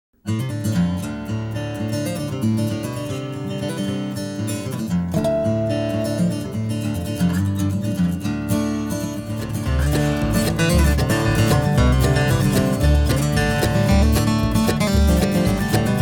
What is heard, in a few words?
Snappy Bluegrass Solos